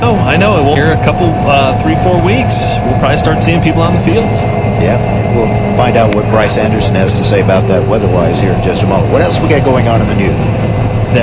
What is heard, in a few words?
Inside Combine Radio